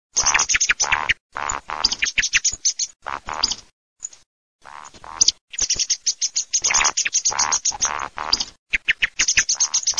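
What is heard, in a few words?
woodcock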